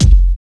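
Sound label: bad boy kick; Drums In Your FACE!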